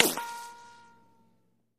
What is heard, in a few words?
Bullet Ricochet Sin